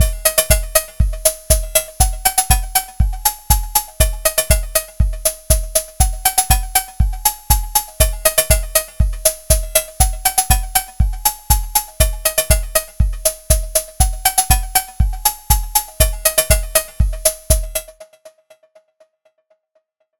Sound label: Dreadbox Drips V2 Delay bd